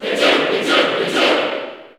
Cheer English; Dark Pit; SSBU

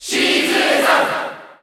Cheer Japanese; SSBU

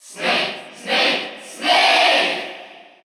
Cheer Russian; Snake; SSBU

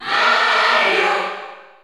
Cheer Spanish; Mario; SSBU

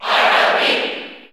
Cheer English; R.O.B.; SSB4; SSBU

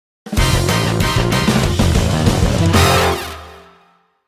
Victory Theme; Splatoon Series

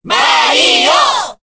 Cheer Korean; Mario; SSBB

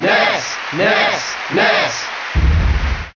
Cheer JP; Ness; Melee

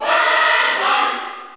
Cheer Spanish; Pac-Man; SSBU

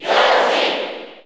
Cheer English; Yoshi; SSBU

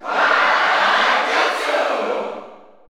Cheer German; Greninja; SSBU

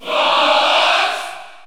Cheer French; Fox; SSBU

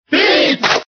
Cheer French; Pit; SSBB

